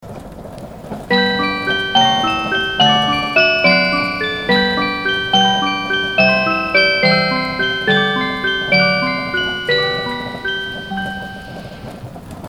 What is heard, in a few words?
Yakumo chime